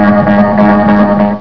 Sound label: Vortex Appears; Maelstrom Simpsons